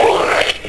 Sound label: Phlegm; Alert Beeps